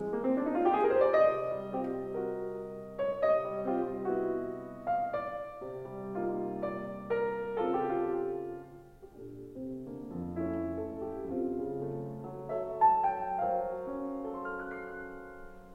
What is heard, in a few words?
mower sonata, flute piano